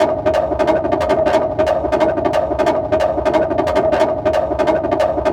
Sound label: Runnin Break, Drum & Bass, Beats & Breaks; Fluid Dynamics